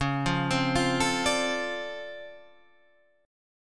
D-Minor 7th C sharp, chord

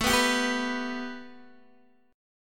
A 7th Suspended 2nd Guitar DADGAD; A7sus2; strummed

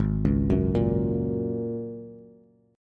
Ab 7th Suspended 2nd Suspended 4th, Bass; Ab7sus2sus4 arpeggiated; 4,4,4,3

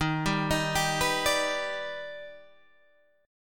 D sharp Augmented Major 7th, Guitar, Open G

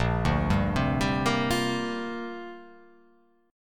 Bb 6th Add 9th Bb; Bb6add9 arpeggiated

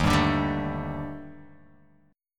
Eb Major 7th Flat 5th Eb Guitar Open F; EbM7b5; strummed; JGuitar

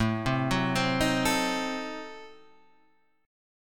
A Diminished 7th A, Guitar Standard; Adim7 arpeggiated; JGuitar

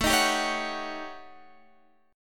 D sharp 7th Sharp 9th Flat 5th

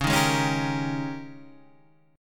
G sharp Major 7th Suspended 2nd Suspended 4th; 9,6,6,6,8,6

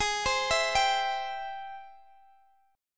G sharp Augmented Major 7th, Guitar, Standard; arpeggiated; capo 2; x,x,18,17,17,15

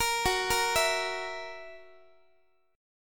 Gb 7th Gb down Guitar, chord; x,x,13,16,14,15

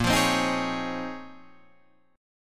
Bb Minor 11th Bb down Guitar Open D

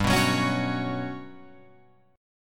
G Major Sharp 11th G down Guitar Open F; strummed; JGuitar

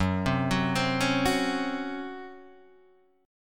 C sharp Major 7th Suspended 4th F sharp

